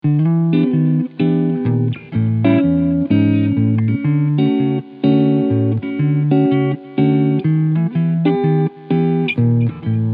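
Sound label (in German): PRS SE Hollowbody McCarty Cleansound Neck Pickup, E-Gitarre; MT TSB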